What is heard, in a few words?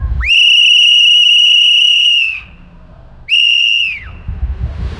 volleyball